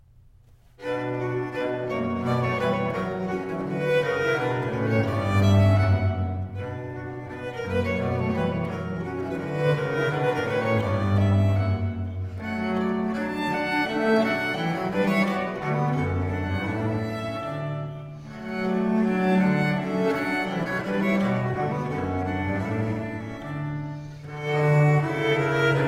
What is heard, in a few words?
Suite in C Minor Jigg, Viols; from The flat Consort; Matthew Locke; Wildcat Viols; Fairest Isle